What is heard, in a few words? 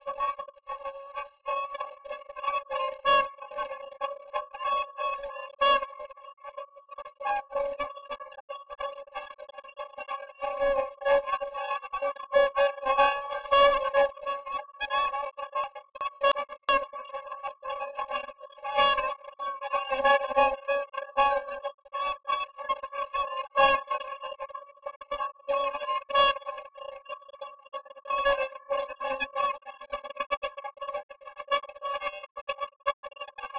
Jazz